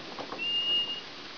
rat

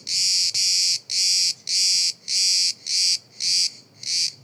cicada mordoganensis

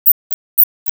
Nyctalus noctula

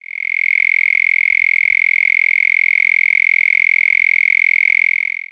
gryllotalpa gryllotalpa

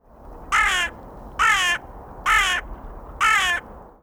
Corvus corone

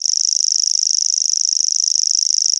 grasshwa

